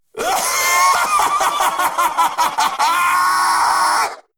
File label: ahhhhahahahhhhaaa; subcon; slender; snatcher